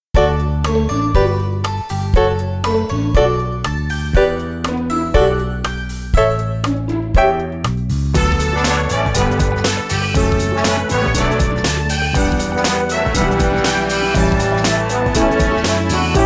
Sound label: Music Upgrade Shop; Army Rescue; Wii